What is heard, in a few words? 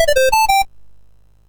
sid MOS6581R2; Popeye